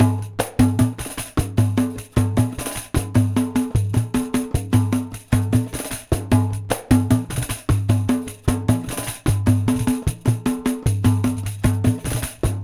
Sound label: pandeiro 152 BPM